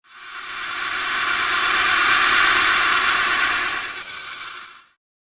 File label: Airy Beam Of Darkness; Evil Sound Effects; horror sound effect